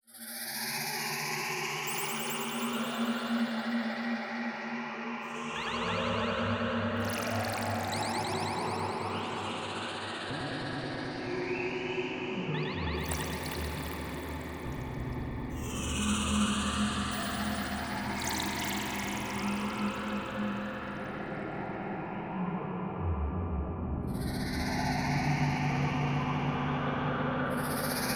Damp Cavern, Some more ambience, this one made me think of an underground lake.